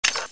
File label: skull chain, weapons